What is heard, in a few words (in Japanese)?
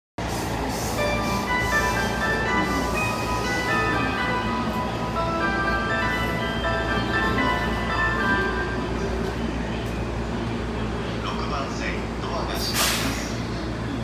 湘南新宿線 赤羽 北, train sounds